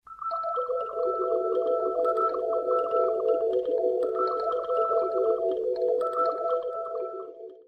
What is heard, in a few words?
musical falling pearls mobile tone ringtone; iphone ringtones